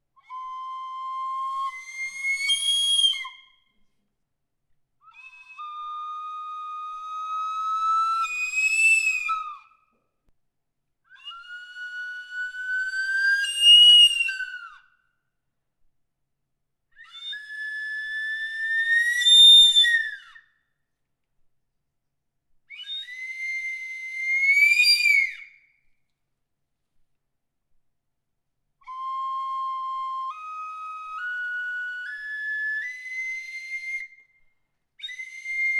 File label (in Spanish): flauta tubular